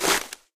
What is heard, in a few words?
debris land; Golden Crayon Sounds